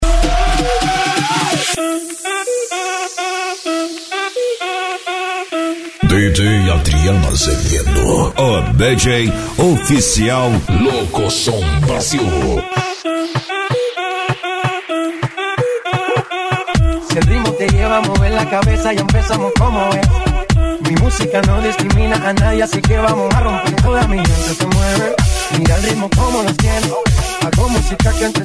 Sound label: dance and edm